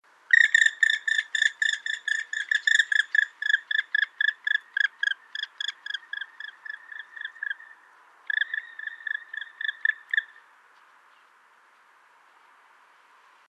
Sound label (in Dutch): Roep van de zwarte specht